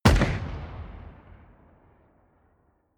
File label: shoot distant